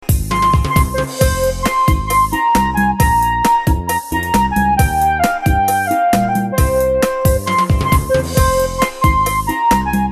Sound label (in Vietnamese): Tieng hat ca dao